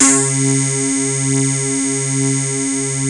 PPG WAVE, OLD SYNTHS

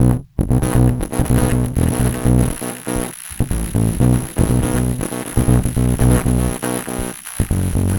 El Distorto, Basses; Sonic Foundry (Sony Creative Software); Modular Electronica

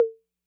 Congas Hi Accent Max Decay, 808

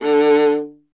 viola D; Miroslav Vitous; Solo Instruments VOL-1

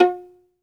Synclavier Strings, SOLO VI; ILIO

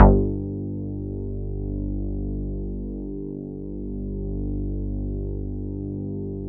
bass, synths

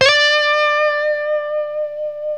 LES PAUL D 5; Spectrasonics; Hans Zimmer Guitars VOL-1

